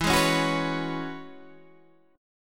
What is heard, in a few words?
E 6th Add 9th E down Guitar DADGAD